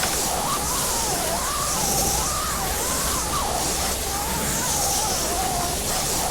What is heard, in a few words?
rope